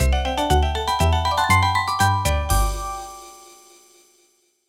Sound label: Jingle Perfect Workshop Reward